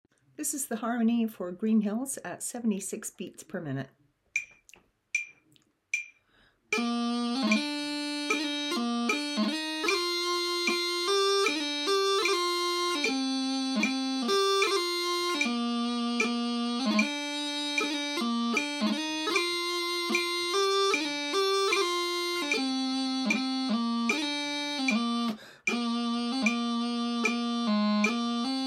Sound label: The Green Hills of Tyrol Harmony, pipers